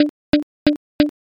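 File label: footsteps flooring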